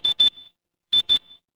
ui beep

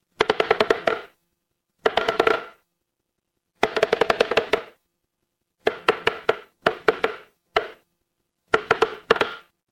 horror creak

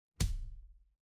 retro game hit

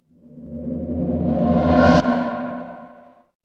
transition riser

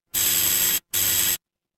door doorbell

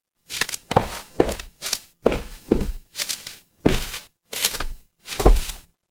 footsteps concrete

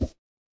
ui delete

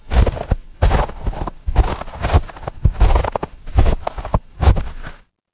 footsteps snow

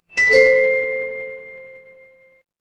instrument chime bar

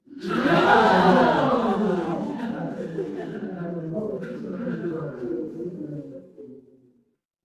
crowd gasp

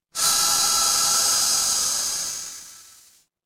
mechanical machine

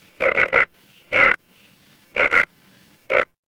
animal frog

